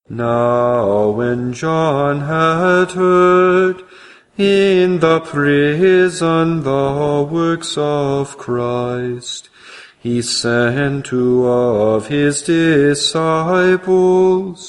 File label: Benedictus Antiphon